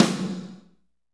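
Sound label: snare, Power Ballad; Northstar; Drumscapes Roland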